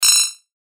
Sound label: Beep Tone Signal Multimedia